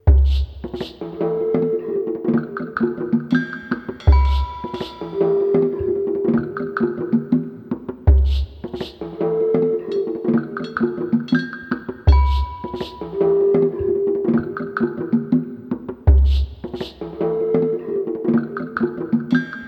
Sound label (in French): Percus Rythmatique